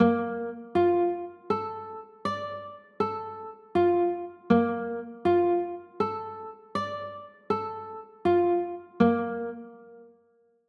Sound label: b flat maj7